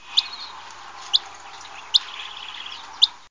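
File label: Hammond's flycatcher, Empidonax hammondii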